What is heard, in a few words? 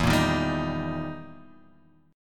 E Minor Major 7th Flat 5th, E, down